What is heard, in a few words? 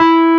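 WURLY NO, Wurlitzer electric piano; Sonic Reality; Vintage Timetraveler; WURLITZ EP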